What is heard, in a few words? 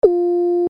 alarm